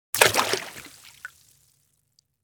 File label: Canoe paddle movement; boat